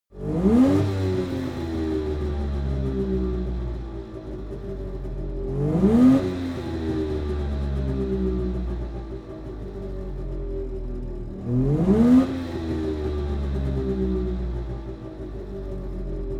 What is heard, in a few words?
car saab 92 rev slow interior